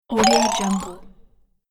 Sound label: speedy jump